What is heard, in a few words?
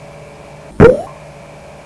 pop, People; Sound Library 2000; Wayzata Technology